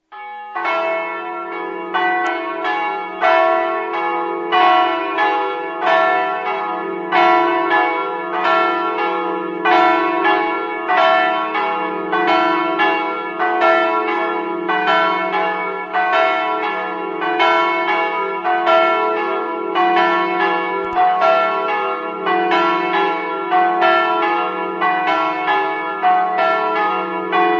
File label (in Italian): clauiano, campane